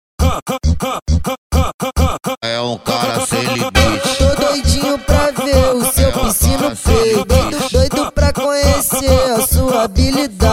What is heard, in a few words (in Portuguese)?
deep house e pisadinha